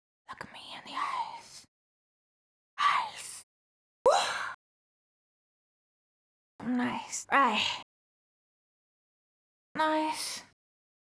CLEAN AD LIBS (EMaj) BPM145, Pop Vocals, Acapellas; Ghosthack; Ultimate Pop Vocals